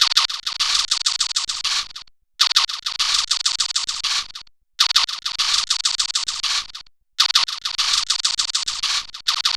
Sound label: Tut Tut Beat, Electronic Beats